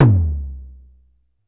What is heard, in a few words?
Drum X Tom Misc; Pearl Drum-X